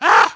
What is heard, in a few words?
big brother yell